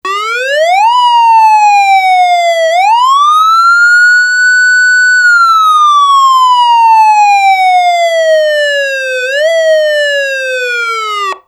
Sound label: manual wail, siren; sa441M